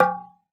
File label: oxaca, percussion